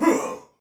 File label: Pain, Soldier Male; Dialog; Barks; Battle; Sinecure